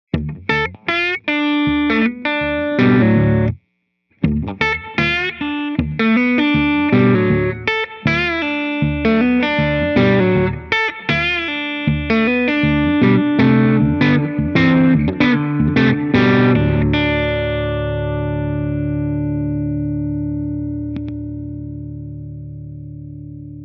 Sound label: Hall Concert Slide